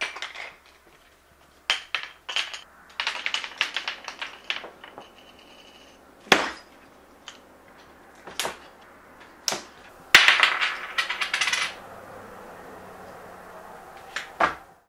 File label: Play POGS Slammers; Captain Vita; Foley; TRUTH-HZ; Crowdsourced Sample Pack